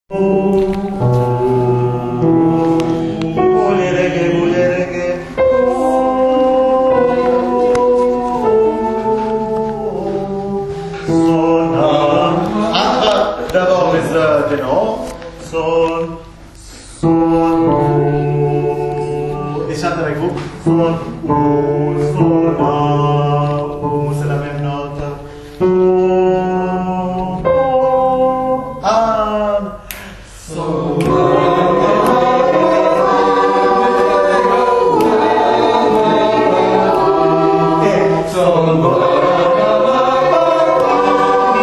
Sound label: BULLERENGUE